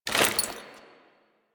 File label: generic foley; Warframe; Warframes; Dagath